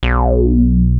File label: micromoog